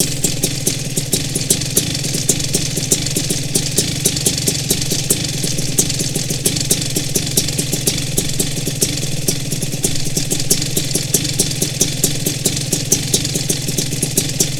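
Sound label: Rotax idle interior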